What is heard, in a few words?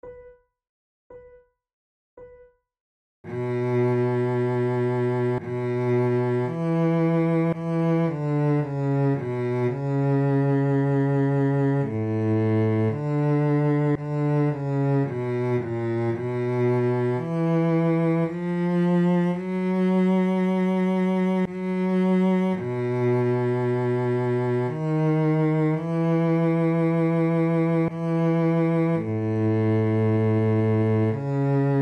adagio, flute